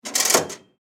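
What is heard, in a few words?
turnstile sounds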